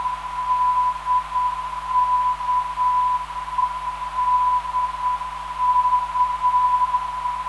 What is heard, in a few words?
328kHz, ndb